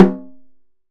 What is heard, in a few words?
Snare Drum; Percussion Samples